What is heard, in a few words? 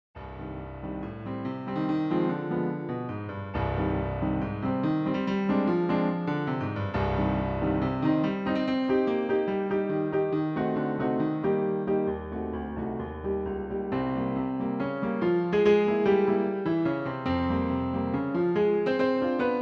Piano Sonata; Opus 21 1st Movement Allegro Appassionato; Chaminade